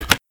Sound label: btn click